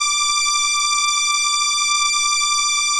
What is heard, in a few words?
ELKA, String Machines; ELKA RHAP